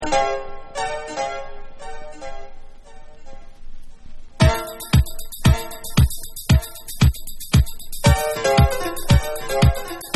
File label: House Machine Piano Dub Version